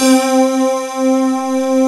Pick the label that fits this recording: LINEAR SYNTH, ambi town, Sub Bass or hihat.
LINEAR SYNTH